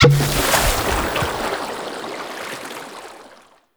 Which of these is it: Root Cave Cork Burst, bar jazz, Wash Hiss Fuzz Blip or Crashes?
Root Cave Cork Burst